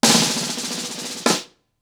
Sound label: Snare FX 90BPM, ACOUSTIC DRUMS